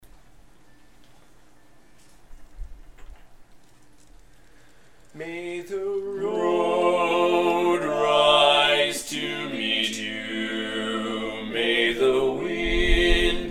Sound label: irish blessing (bass dominant)